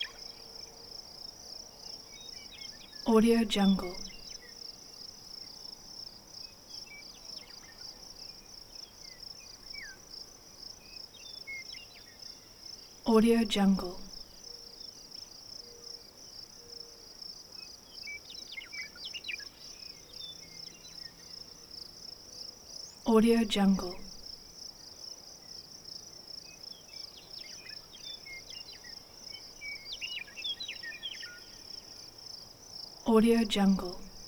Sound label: ambience south dakota badlands late afternoon june